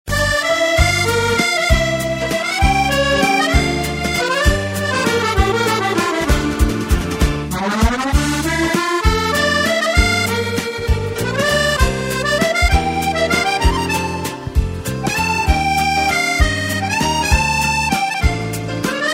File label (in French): extrait melodie pour valser